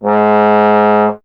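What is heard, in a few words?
bass trombone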